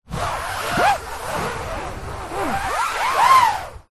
household canvas frame picture large fingernails rub scrape weird tone; zapsplat